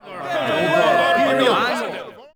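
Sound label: men murmur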